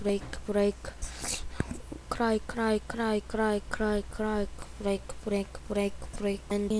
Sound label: cry